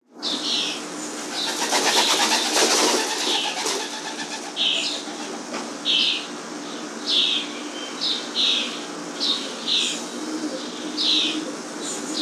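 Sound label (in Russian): голуби на чердаке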